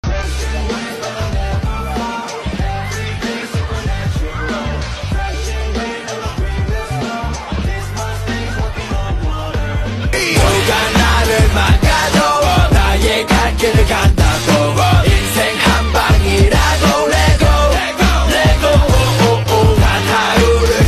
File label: Ringtone